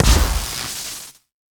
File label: hit shock hard; CrossCode; battle